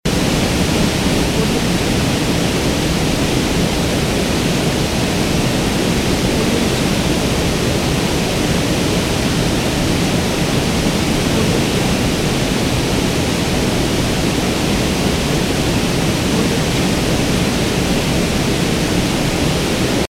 waterfall

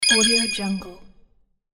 cartoon

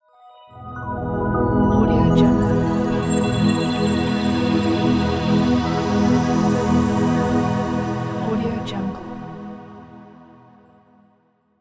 atmospheric logo

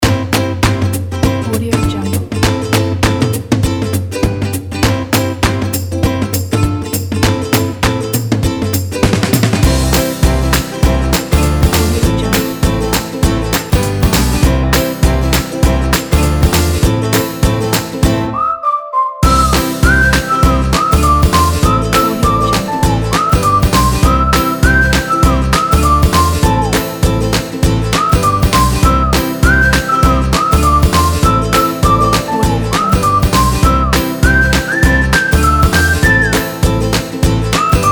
happy

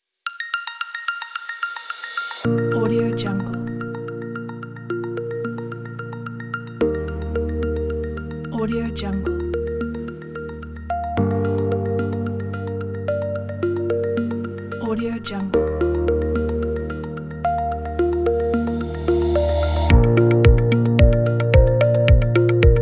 tech background; orangeofsound

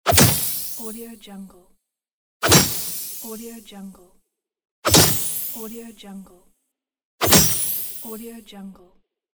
magic sword hit

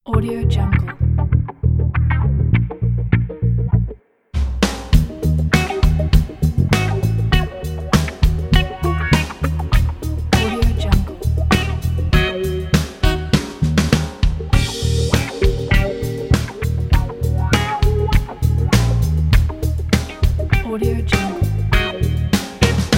wah wah soul train

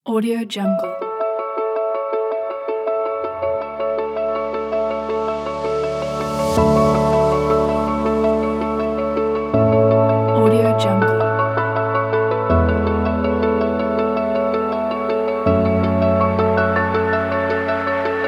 inspirational light ambient

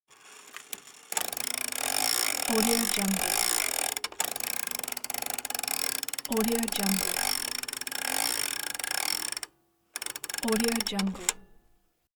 vintage clock wind up

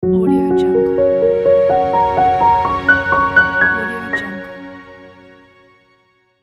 soft piano and strings logo

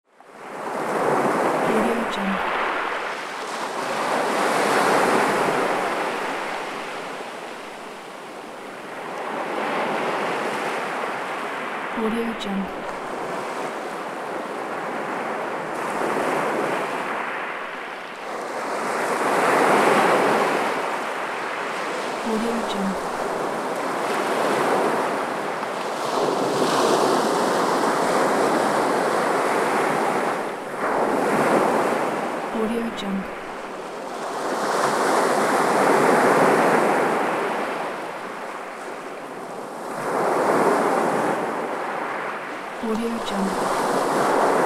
ocean waves

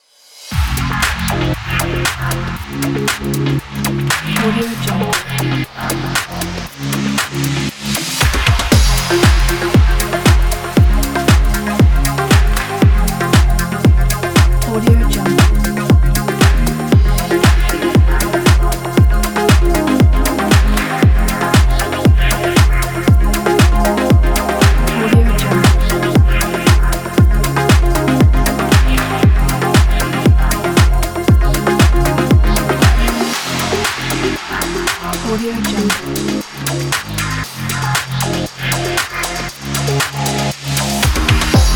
summer house

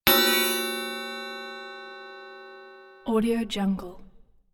elemental chimes magic balls; soundmorph

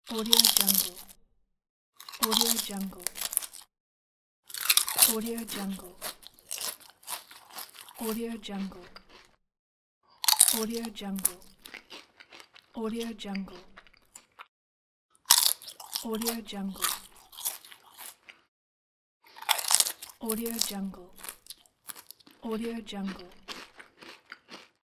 eating chips

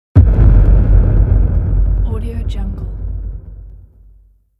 impact

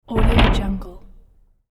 shake and rattle heavy wood and glass door; sound ideas

